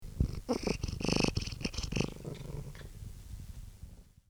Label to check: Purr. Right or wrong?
Right